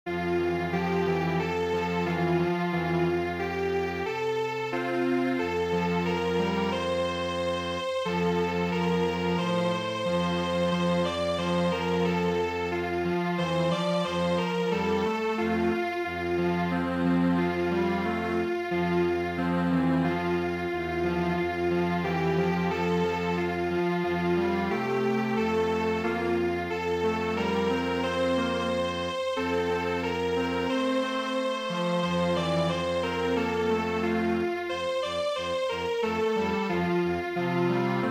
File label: Strings Quartet